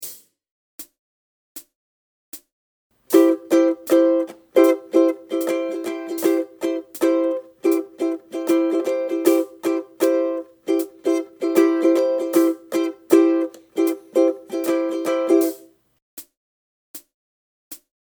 Strum, ukulele

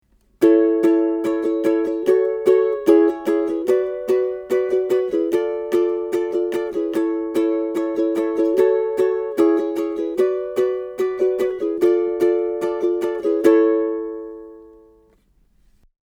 Verse Strum, ukulele

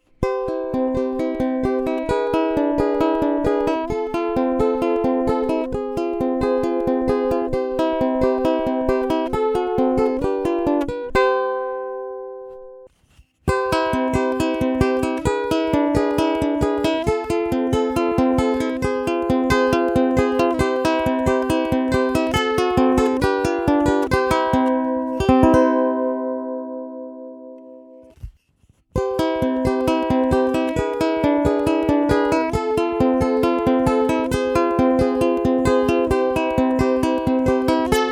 clean, ukulele; RISA

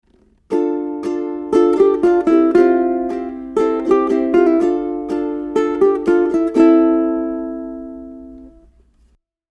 Bridge Lick, ukulele

